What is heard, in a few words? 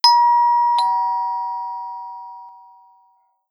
Ding Dong